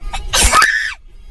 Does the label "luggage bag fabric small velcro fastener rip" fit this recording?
No